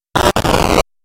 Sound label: computer glitch